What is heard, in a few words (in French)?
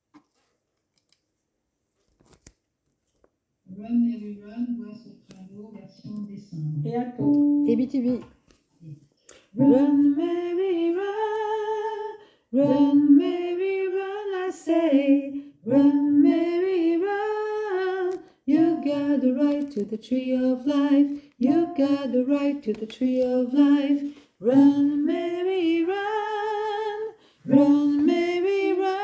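VOIX basse tenor baryton en fond alto soprano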